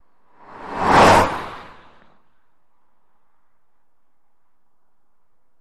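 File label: Car Fast Highway By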